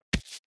landed on the ground

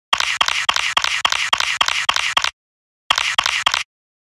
camera burst capture

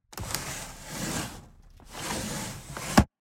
wooden sliding door

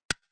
computer click sound

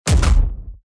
SMACK

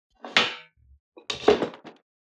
metal texture

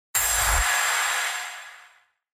UI comform sound

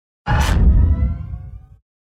Whoosh

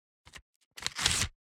paper tear off

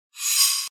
UI comform sound

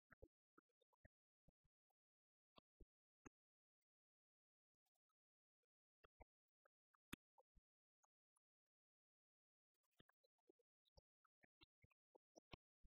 Armoured SAMURAI walking

